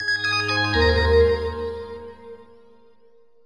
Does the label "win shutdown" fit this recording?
Yes